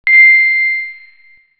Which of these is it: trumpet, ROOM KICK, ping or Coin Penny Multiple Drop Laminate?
ping